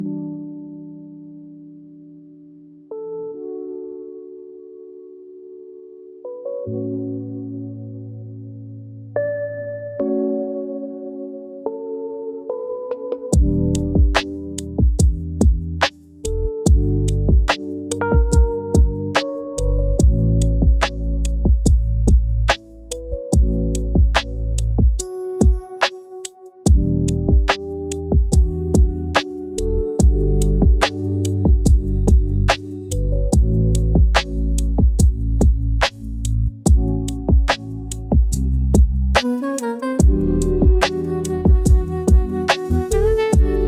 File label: focus lofi